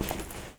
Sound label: Rustle Land; openclonk